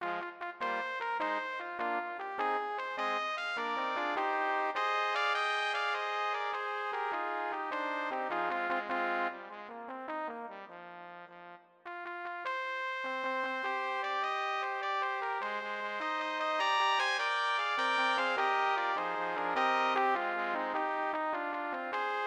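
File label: Moorside Suite Scherzo trumpets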